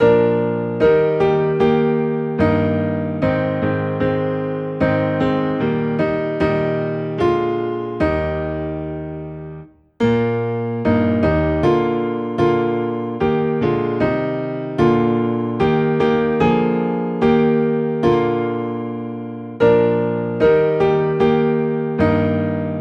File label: Piano Low in E Flat, hymns; Oh for a Thousand Tongues